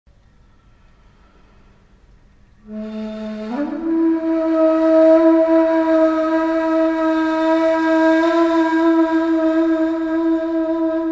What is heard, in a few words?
Ney Taksim